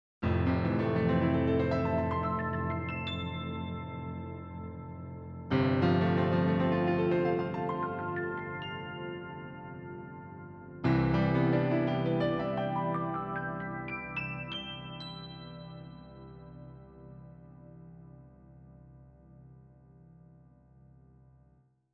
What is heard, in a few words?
yamaha cp40 stage piano dx high notes